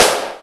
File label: sharp snare one shot c key, Royality free acoustic snare tuned to the C note